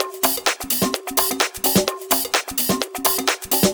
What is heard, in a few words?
Latin House Loop 128 BPM; Vengeance Samples